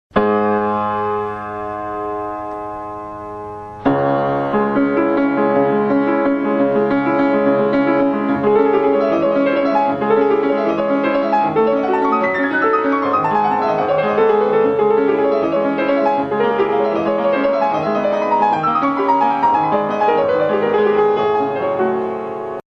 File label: steinway piano; pablo casals